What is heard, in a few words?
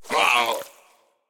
minion death grunt; smite; npc; roman archer